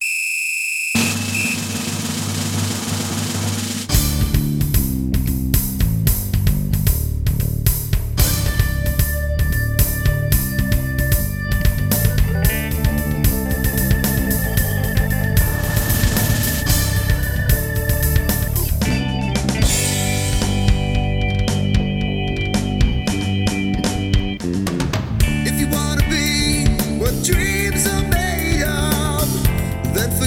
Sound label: male keyboards bass; music smorgasbord